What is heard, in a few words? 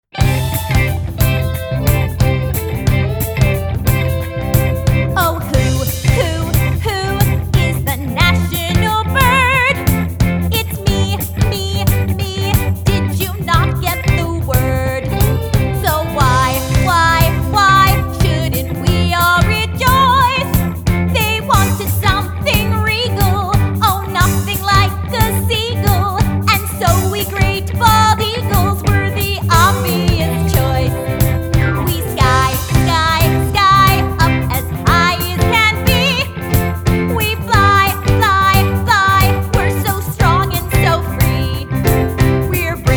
vocals